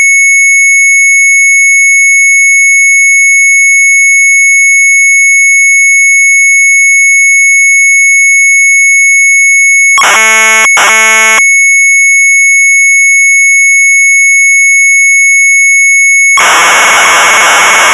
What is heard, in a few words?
MZ800 Tape; CMT ALFABET; sharp; retromelsarchive